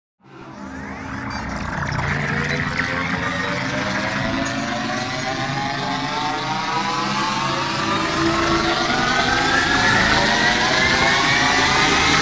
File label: Risers Hyperbolic; Gothic Storm Music; Advanced Sound Design 2012